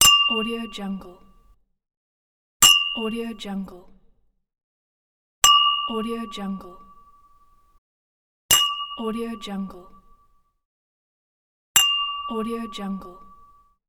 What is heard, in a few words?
glass clink